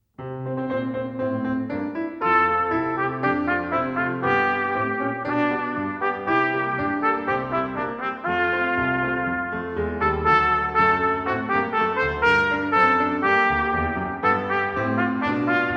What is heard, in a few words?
performance, trumpet; canadian brass easy solos